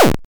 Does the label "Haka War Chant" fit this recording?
No